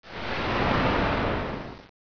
wind gust

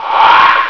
buzz; hornet

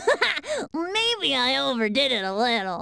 death; goten

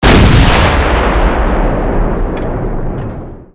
30cal shoot, weapons

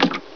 rat step

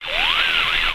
radio on

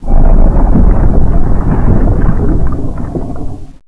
barred door move

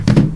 flare torch put

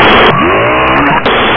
zombie hgrunt alert

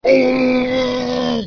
ohurt

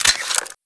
clip out, weapons; paranoia

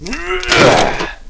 gatling pickup, weapons

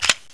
shotgun cock

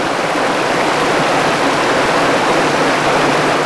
Waterfall smaller

